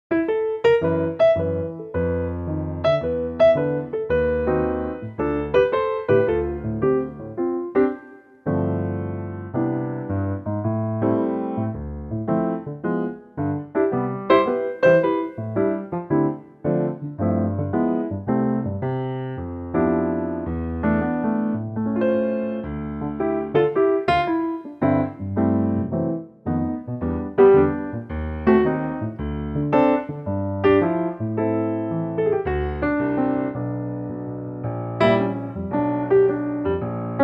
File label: C piano, male